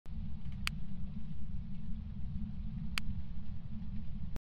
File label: equitment sound; phasmophobia